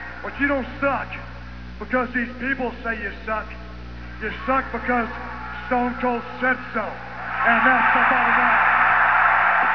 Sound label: rock